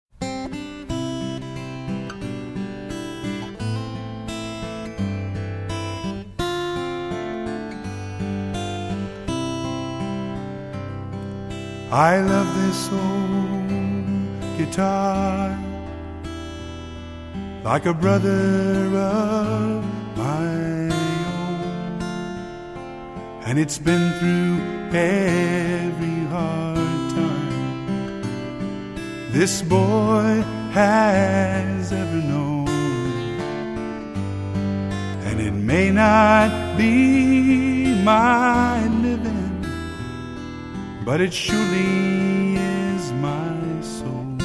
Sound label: Guitar